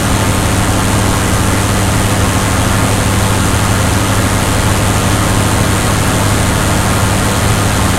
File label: m1 engine rpm 99 load, tanks; War Thunder